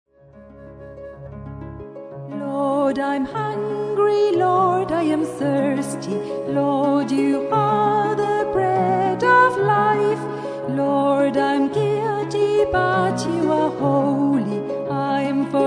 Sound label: irish song